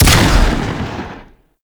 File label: Double Barrel Shotgun Fire; Warframe